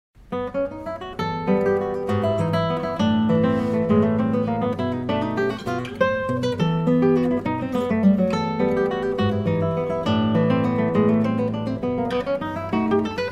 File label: Guitar Waltz